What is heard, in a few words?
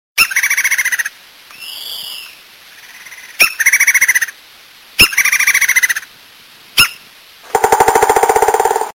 Forest Bird